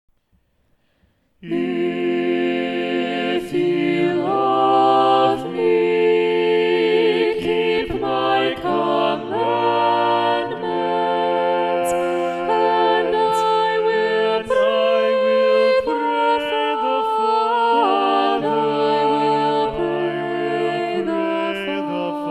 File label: If ye love me SATB Balanced Voices; Thomas Tallis